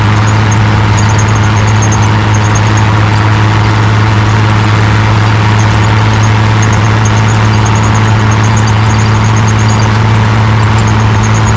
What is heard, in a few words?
l6 pet, engines; acf engines